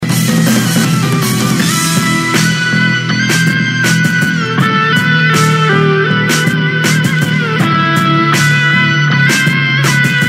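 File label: background music, Ringtones; Shaurya Goenka; Ek hasina thi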